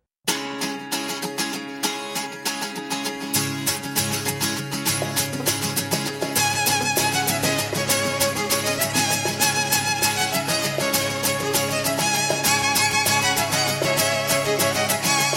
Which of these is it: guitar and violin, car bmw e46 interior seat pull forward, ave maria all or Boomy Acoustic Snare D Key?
guitar and violin